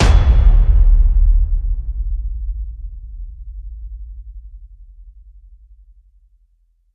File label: Hit Low Blowout